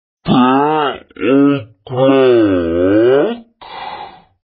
clock

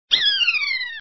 toad jp

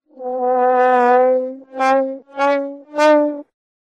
trombone, reverse

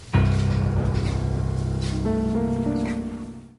dramatic

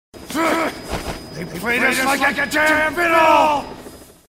fiddle

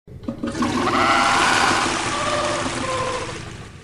flushed

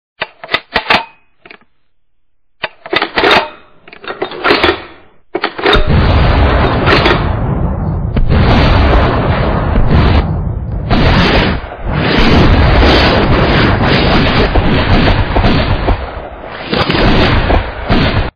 gun

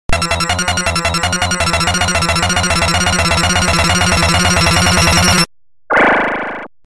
drowning

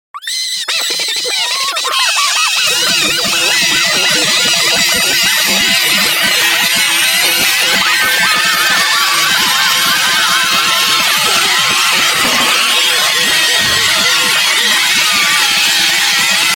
screaming

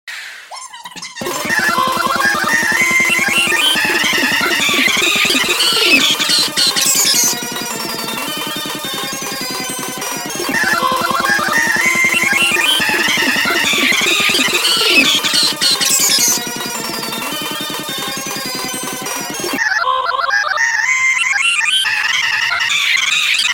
pitch shift

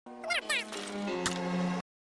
die

